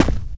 charger run left, footsteps; player; source engine; left 4 dead 2

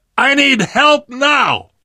dying, survivor voice

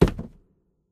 phys cube, physics

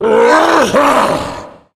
tank throw; yell; Left 4 Dead; Source Engine

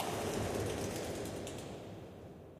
amb wing flap, birds; video games; ambient; nature; source engine; portal2

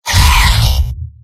pain sharp; heavy; source engine; tf; mvm

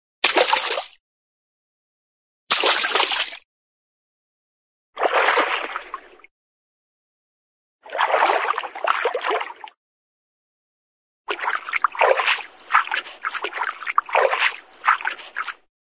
water, splash light splash, cartoon

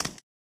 taunt workout hand; video games; source engine; tf

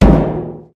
metal barrel impact soft; Source Engine; video game; physics

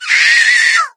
death, infected female

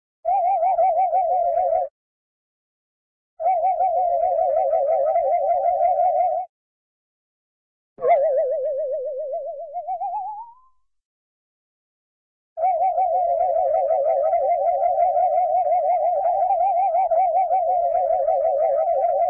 wobble, cartoon saw blade wobble, short

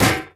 physics turret gibs

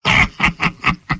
laugh short; soldier; video games; source engine; tf; mvm

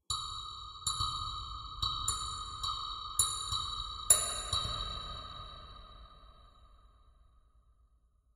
ambient synth grain click

